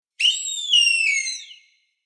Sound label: Hawk and eagle calling and screeching; bird